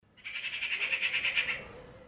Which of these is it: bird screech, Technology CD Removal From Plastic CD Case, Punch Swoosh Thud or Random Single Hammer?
bird screech